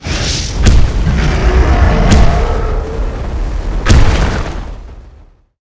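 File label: boss spawn; sinhead; lava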